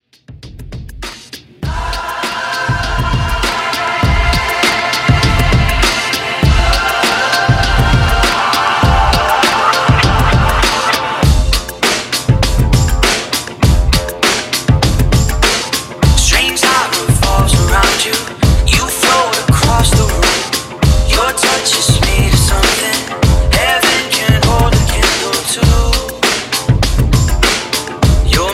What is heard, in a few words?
Top40 Drum Break 100 BPM